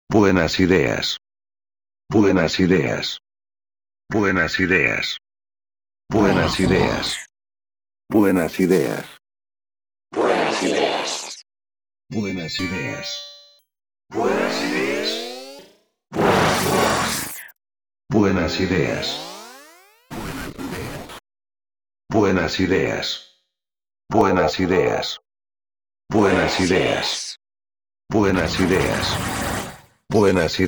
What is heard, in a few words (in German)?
Klangbeispiel EFX REFRACT Vox; ARTURIA